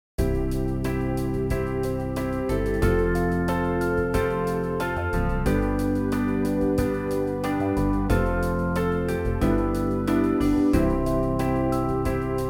instrumental; New Hope Music

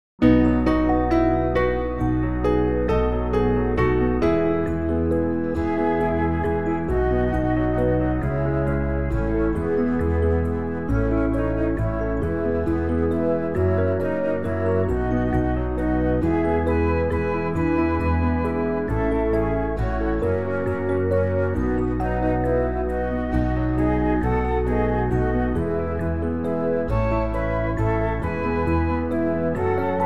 instrumental; New Hope Music

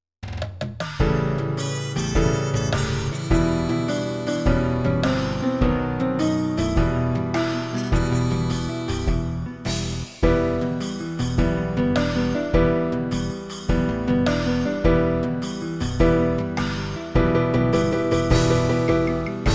backing; New Hope Music

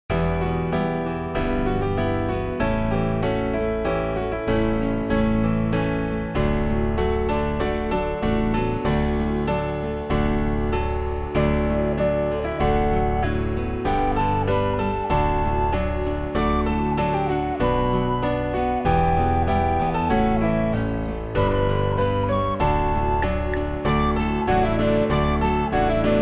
instrumental; New Hope Music

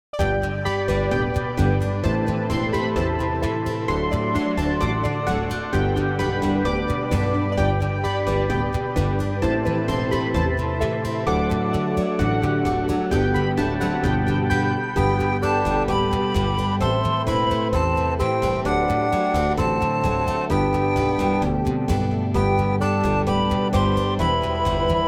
instrumental; New Hope Music

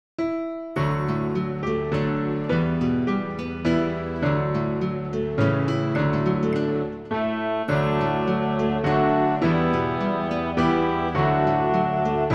Sun And Shield Round instrumental; New Hope Music

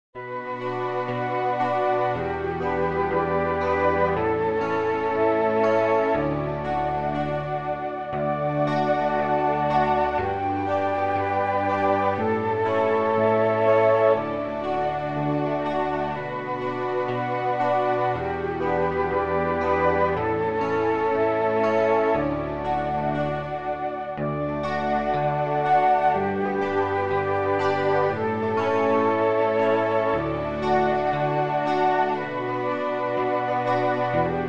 I Will Walk backing; New Hope Music

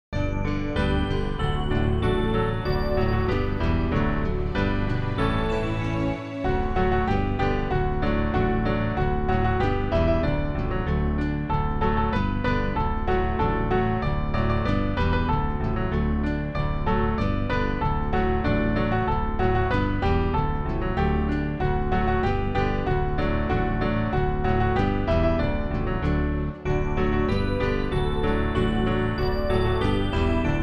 Oh What A Sight backing; New Hope Music

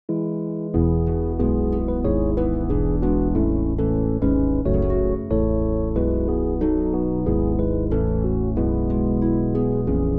SATB; New Hope Music

